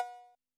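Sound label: COWBELL ANALOG, Yamaha SY85 Drums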